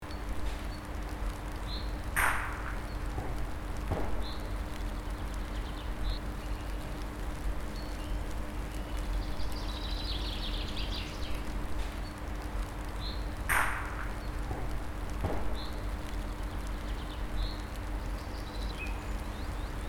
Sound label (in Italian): Gioco delle bocce